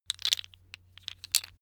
tiles wood clatter; objekte; holz

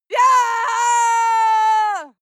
female lunatic scream attack long hard; menschen; schreie

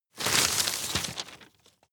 rustle hard short; berufe; foerster

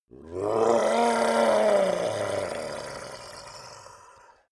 monster dark growl; kreaturen

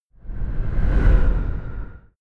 sci-fi vehicle train passing fast short

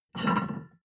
plate on plate take hard

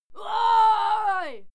female cheer bawl; schreie; menschen

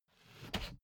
briefcase plastic slide; industrie wirtschaft; bueroarbeit

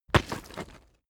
stone stone drop

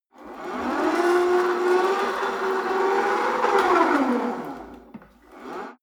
rope trainer pull sequence medium, fitnessstudio; sport freizeit

